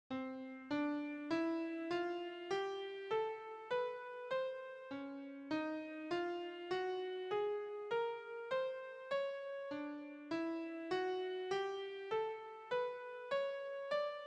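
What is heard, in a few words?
All Major Scales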